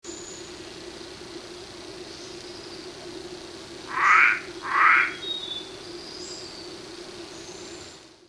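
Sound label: limnonectes hascheanus, Hill Forest Frog call; Fraser's Hill, Peninsular Malaysia, 20 May 2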